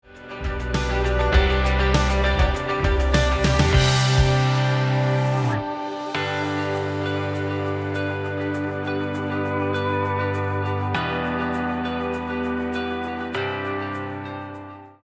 country

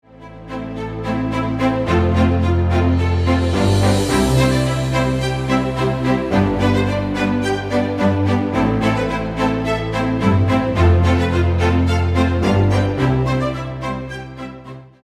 classical

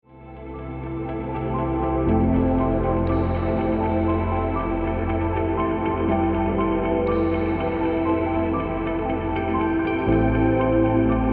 ambient

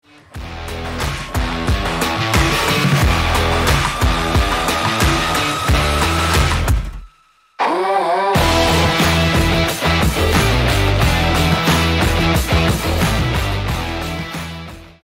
rock

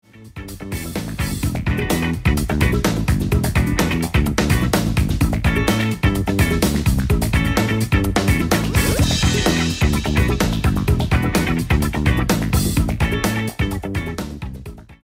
funk

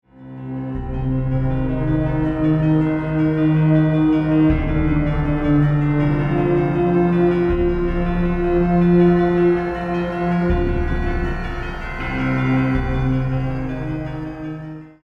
scary

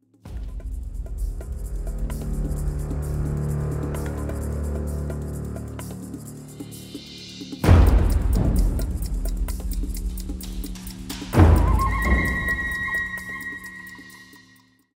tribal

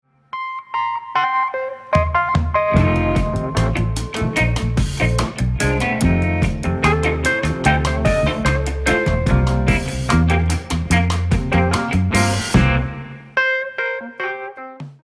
jazz

